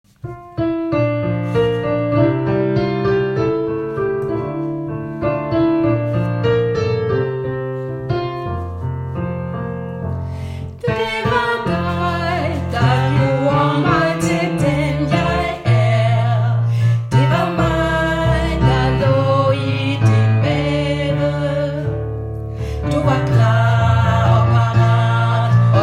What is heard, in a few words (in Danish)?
dine øjne tenor